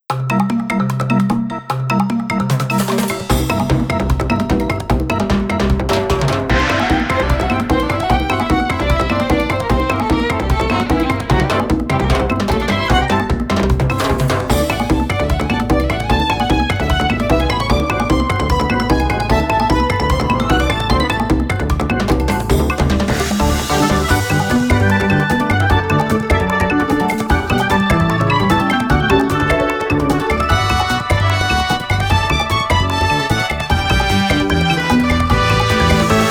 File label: Spinning Jungle (Jungle Area); Kirby Triple Deluxe